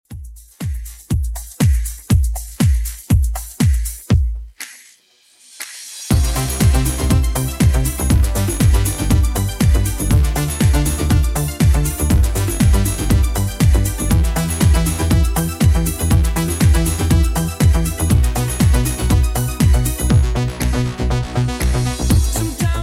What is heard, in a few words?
90s Redrum 120bpm